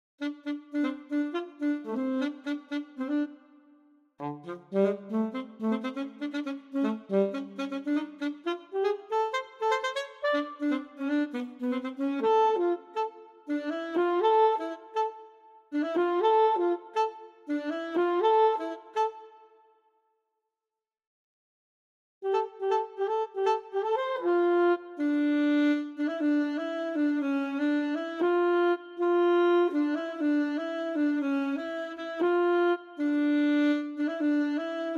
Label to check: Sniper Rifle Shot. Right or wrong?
Wrong